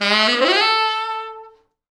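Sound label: tenor sax runup, A3; East West; Quantum Leap Horns Sax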